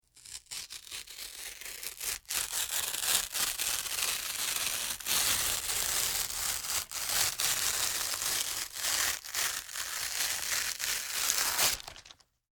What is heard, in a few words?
Paper Rip Foley Tear; Materials